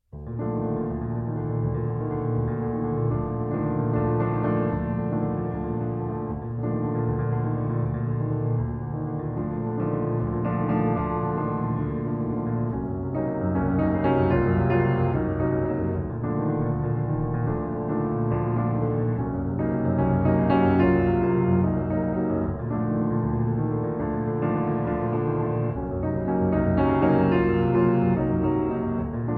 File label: Études For Solo Piano